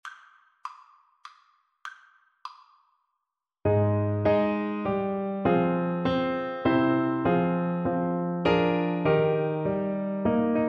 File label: BARI, Baritone Horn